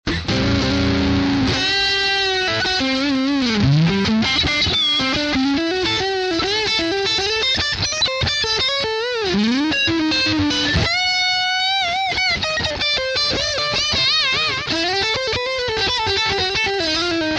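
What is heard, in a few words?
bluesy lick, guitar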